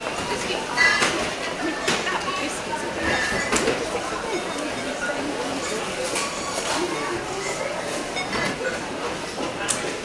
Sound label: ambience store supermarket; sound effects one